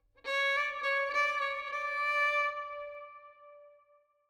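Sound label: Verb, Violin, 4-4, D minor, 100 bpm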